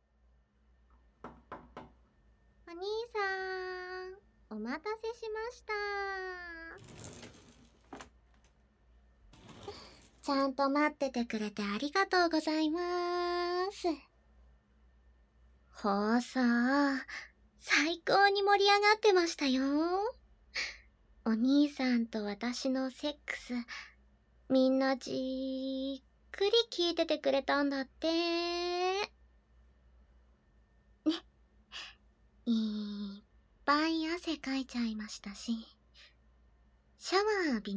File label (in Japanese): 耳舐め, ASMR; SE控えめ; こっそり抜け出して